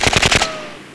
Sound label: Gatling Gun; Army Men TiS